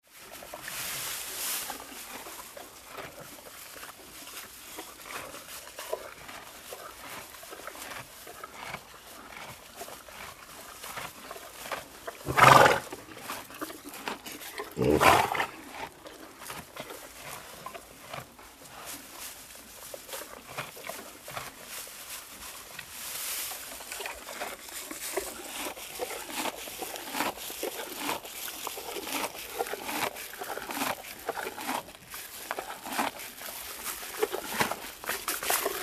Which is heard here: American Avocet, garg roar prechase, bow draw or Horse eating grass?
Horse eating grass